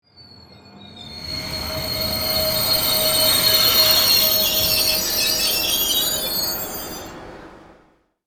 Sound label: Train brake screech and slow down; vehicle